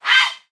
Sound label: grey heron cry